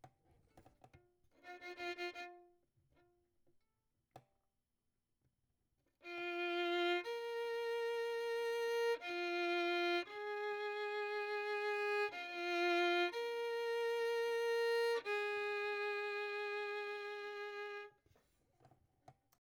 Violin; Verdigris Music

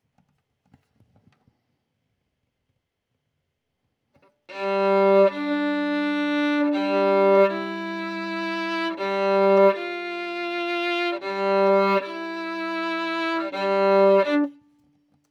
Violin

